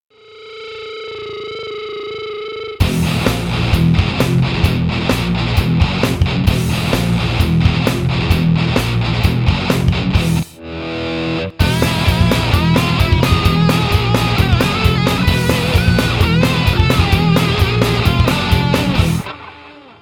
JCM900, virtualne aparaty

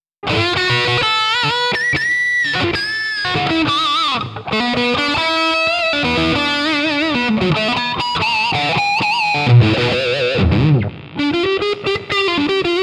JCM900 lead

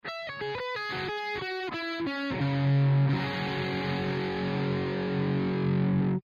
treadplate dual

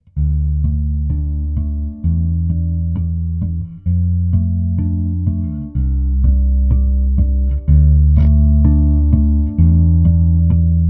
humbug, bass

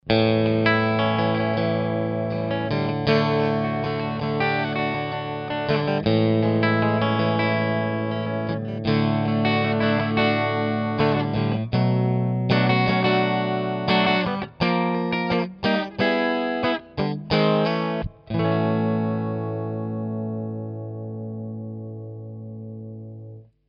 clean, mesa

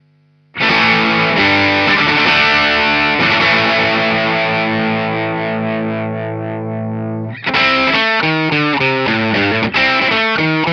pedal custom, tele

